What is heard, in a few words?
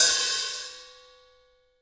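JAZZ KIT overhead, Drums Acoustic; AKAI; 90s sample CD; USB Soundscan vol.10; JAZZKIT 3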